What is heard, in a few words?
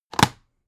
dvd case throw down